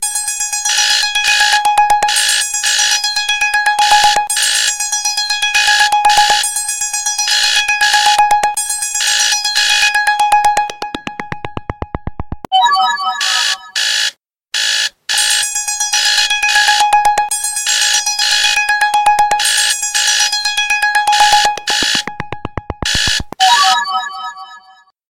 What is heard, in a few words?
Super Alarm Buzzer